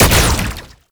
Proto Infested Brachiolyst Projectile Fire; Warframe; Abilities

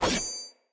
Axe Whoosh Stab; Weapons; Enemy; Axes; Glades Axe

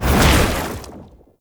Wall Climb Deactivate; Warframe; Oraxia

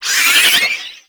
Hand Bomber Proj Warning; Warframe; ManInTheWall

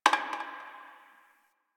Garbage Metal Can; Warframe; Ambience; Nineteen Ninety Nine

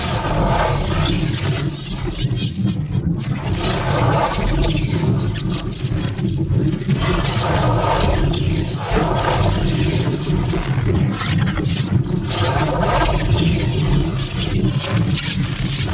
Pulse Projectile Range Loop; Warframe; Gyre

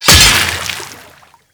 Axe Impact Water; Warframe; Weapons; Impacts

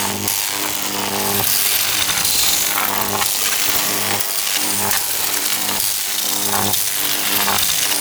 Sniper Charge Up Loop; Warframe; Sentient; Caliban Prime Sniper

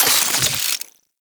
Reload; Warframe; Weapons; Tenno; Wraith Sidearm; Epitaph Prime

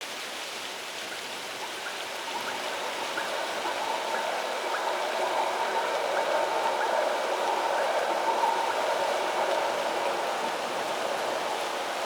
Day Amb Loop, Ambience, Glades; Glades Of Andurin

